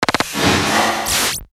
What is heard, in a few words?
machine gun with laser returning fire; sound ideas